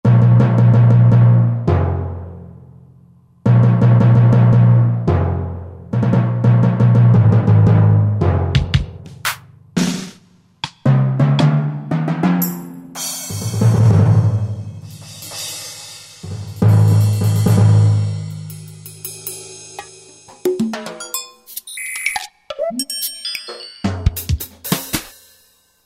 Orch Drum Set, Roland JV-2080; Rhythm

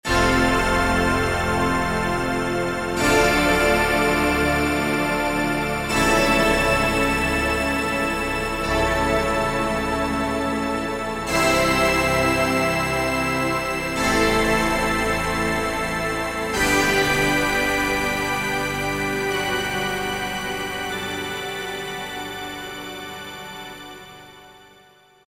DRAMA, Akai SG01v

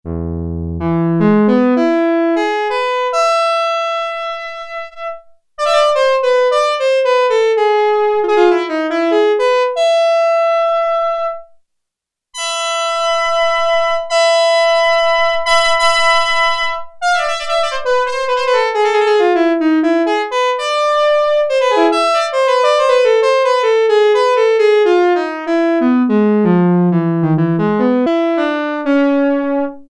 Arco Violin, Prophet-5; Sequential Circuits Prophet-5 (Ver.3); Factory Patch; File 3 Programs

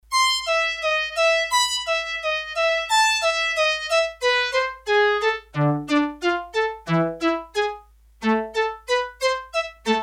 Solo String, Korg DS-8; Factory Patches

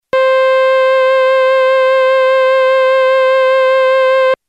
Wave SAW, Roland D-50; Waveform demos

